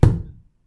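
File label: hit wooden pillar; knocking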